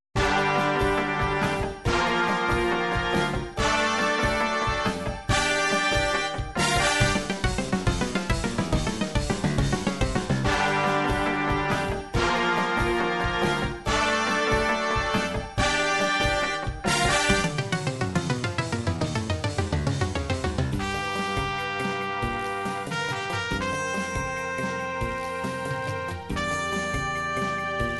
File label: jazz, midi